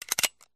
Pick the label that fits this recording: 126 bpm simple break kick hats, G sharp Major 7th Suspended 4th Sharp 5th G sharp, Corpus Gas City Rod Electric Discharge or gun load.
gun load